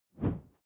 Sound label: whoosh simple short airy low